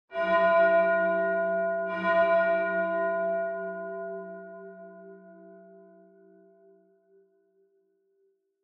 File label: Church Bell Far High Mid